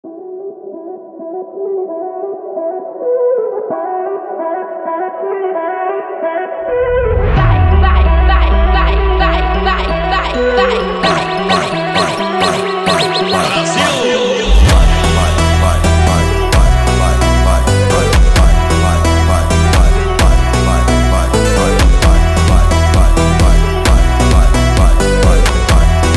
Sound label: Funk Misterioso Brazilian Phonk